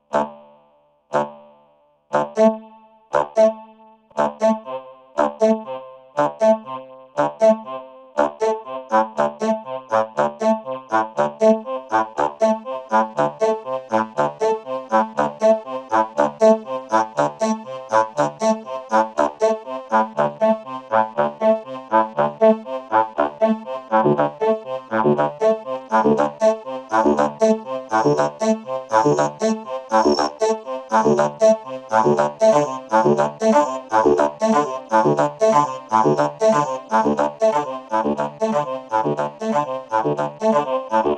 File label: seq steps